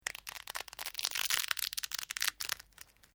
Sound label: Dry Brittle Snapping Long